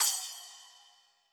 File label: splash, splashes